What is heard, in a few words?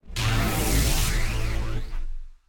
laser fly by; gamerip; shieldmaiden remix edition